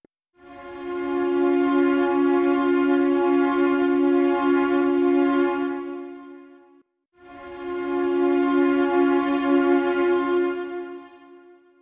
Strobing

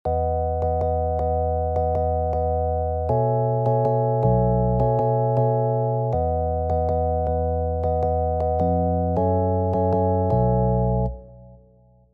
Chords Updated

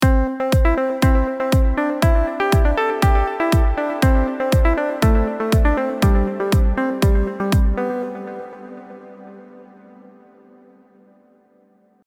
melody

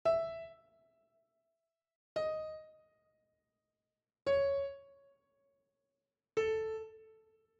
Counter

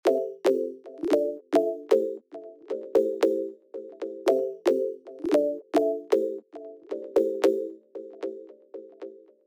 ducking

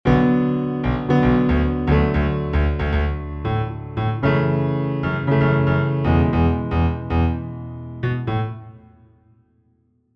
chords n bass

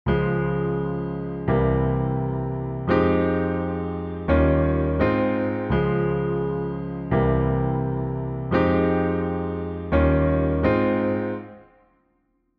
verse sus

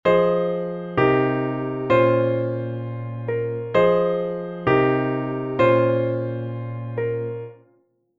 intro sustained

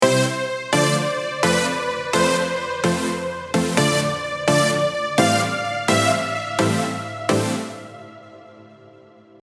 dissonance unison

